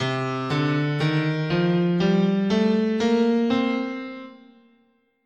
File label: C Dorian, scale